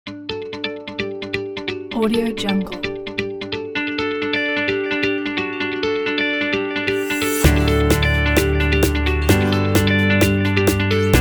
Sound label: happy motivational